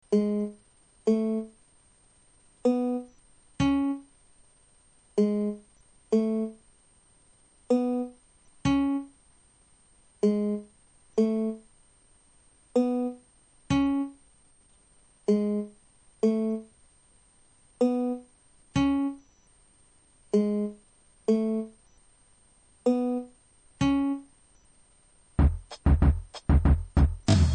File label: Synth Bass + Drum